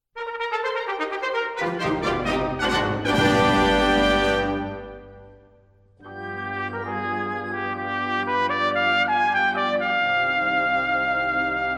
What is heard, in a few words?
Polka